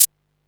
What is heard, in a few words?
perc, Single Drums; percussion